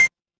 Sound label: retro game coin